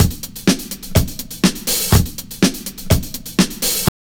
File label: REAL DRUM LOOP 127; Ueberschall; Jam Box